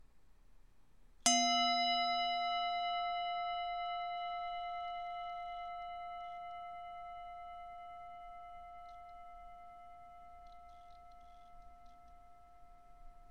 Singing Bowl

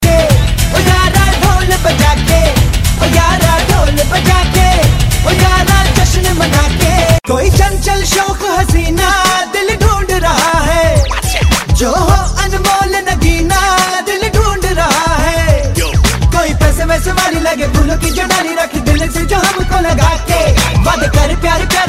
Dhol

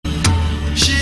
Mahadev Shivaaaaa

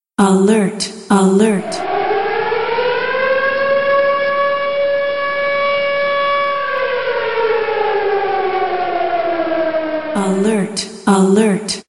Alert Sirens

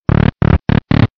Castle Door; Mario

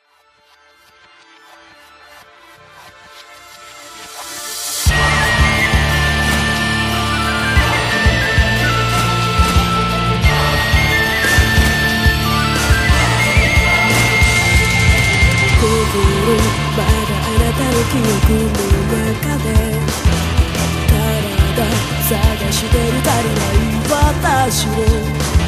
Outro; Claymore

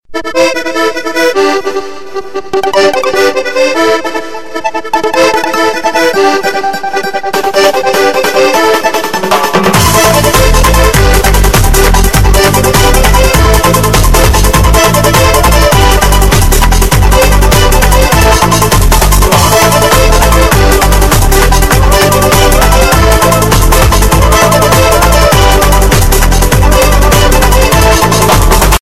Beautiful Ringtone

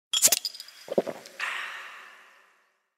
Cola Bottle; Nuka

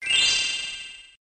Gem SFX; Spyro

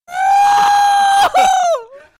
Squawking

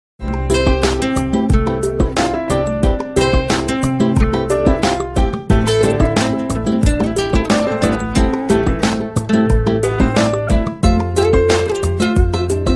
Rainy Guitar

